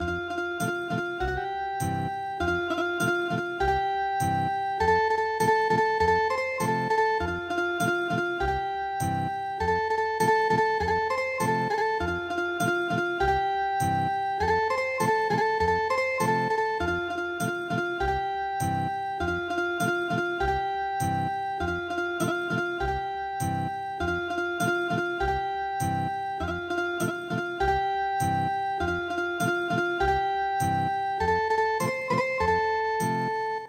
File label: Ethnic Guitar, midi; traditional